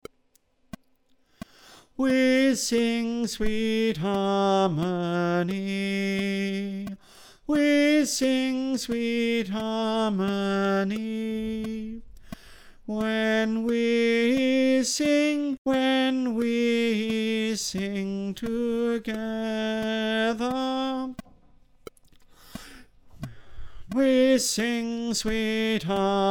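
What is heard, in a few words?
tenor, choir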